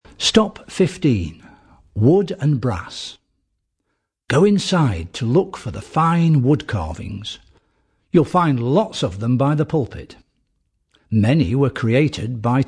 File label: Wood and Brass